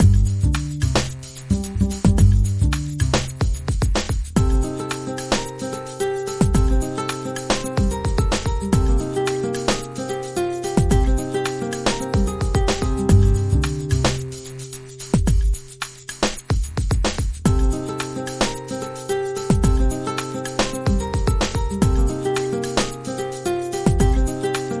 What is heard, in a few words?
Nu Jazz Guitar